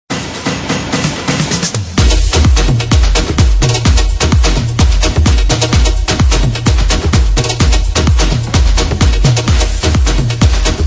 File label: Groove House Samples; Incognet